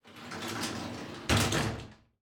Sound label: Shower door closing